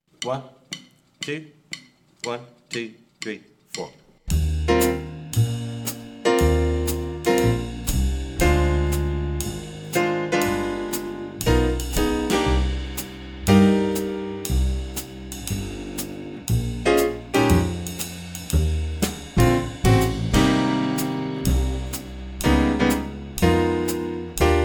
swing etude - full rhythm section play along for winds